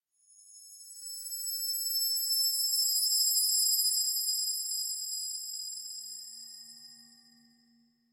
Metallic High Pitched Transitional Tone - Eerie Musical Horror; Hollywood Edge; sound designer's toolkit v2